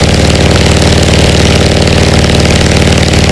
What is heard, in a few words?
vtwin medium, engines; acf engines